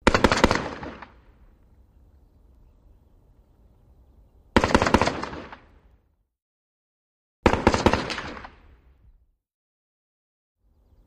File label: AK47 3 Shot Groups Mon